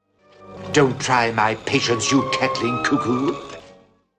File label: cackling cukoo